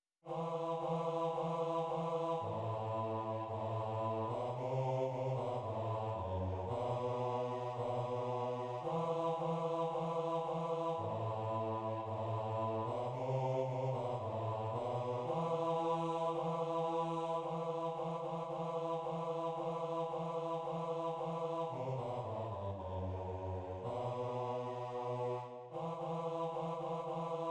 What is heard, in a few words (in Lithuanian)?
BASS; Šventas; ŠV AUGUSTINO MIŠiOS